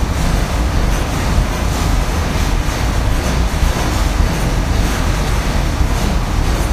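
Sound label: rattling fan slow loop; Source Engine; Portal 2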